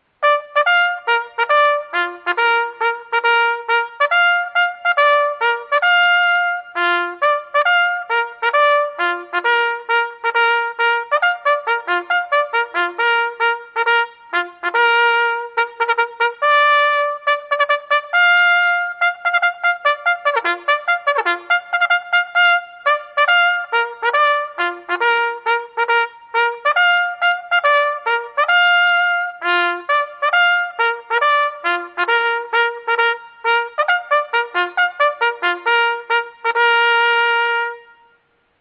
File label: Bugle Call To The Colors